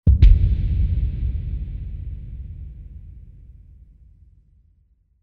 Creepy Percussion

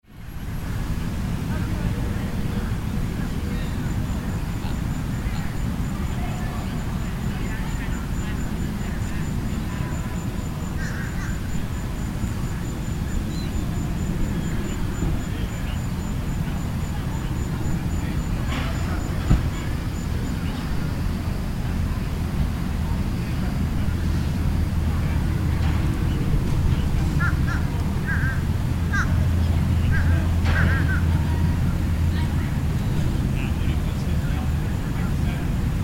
Suburban Park

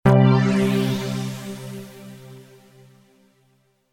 Power Up

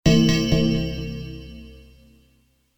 Bells

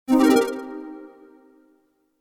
Synth Chime

